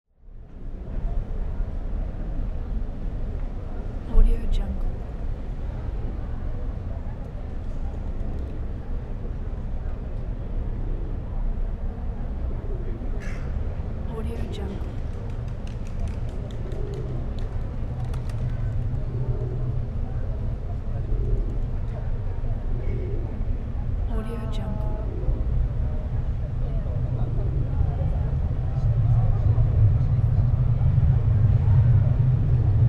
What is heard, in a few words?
city medium square midday ambience; urban sounds